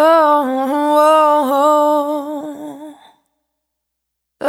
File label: Urban, Single Voice Melodic Loops, Vocal Samples; Pocket Diva World Class Vocal Samples